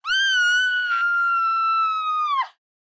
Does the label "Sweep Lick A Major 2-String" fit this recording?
No